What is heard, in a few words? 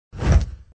Plate Up; bobtherobber2